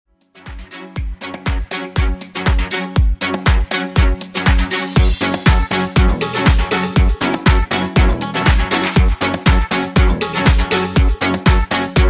House Disco, 120 Bpm

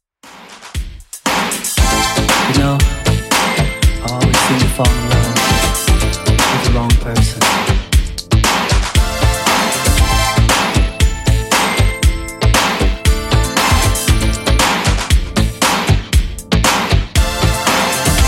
80s Redrum 117 bpm; remixplanet

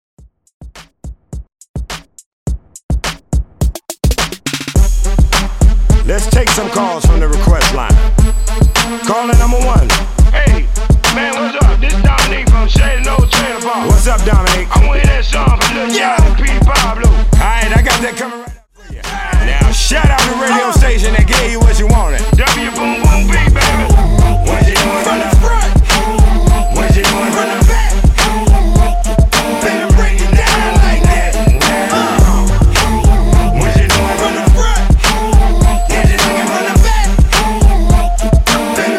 Hiphop RNB Redrum

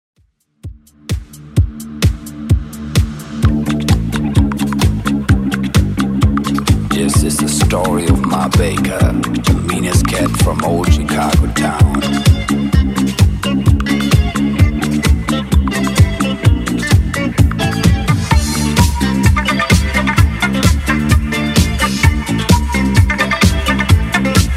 80s Redrum 129bpm

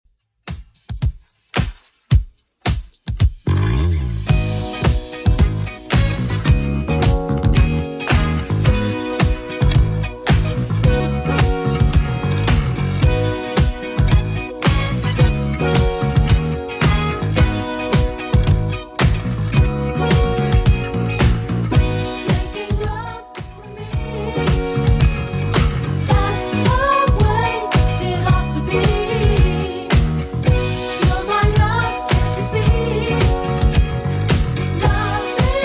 80s Redrum 110bpm CLEAN

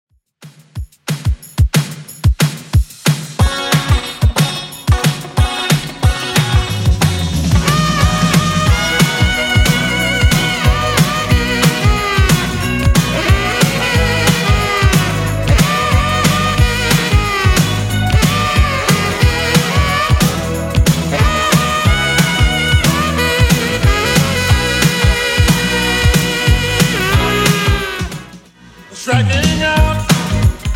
80s Redrum 182 bpm

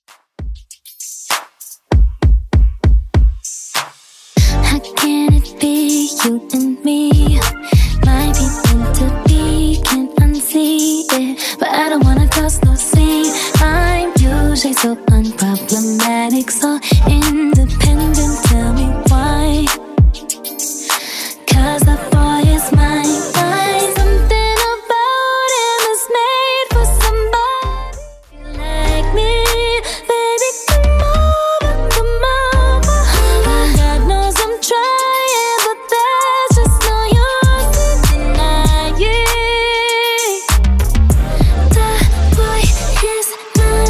Top40 Redrum 98bpm

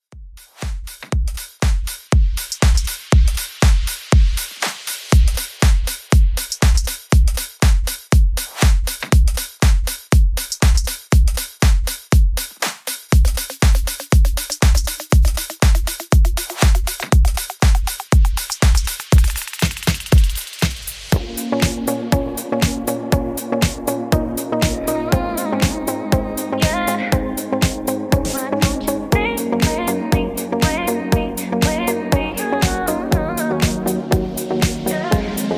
Club House 120Bpm

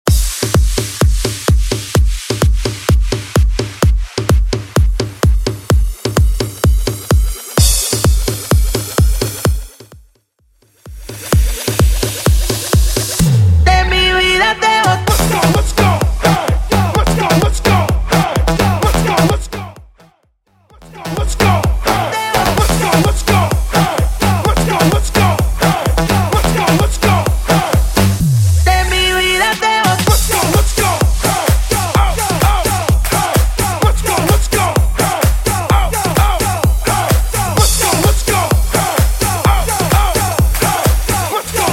EDM Mash Up 128 Bpm; Bootleg

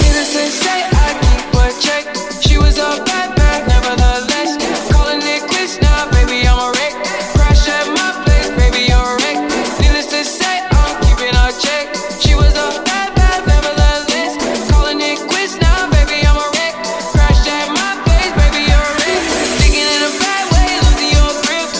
Sunflower 98 bpm Bm

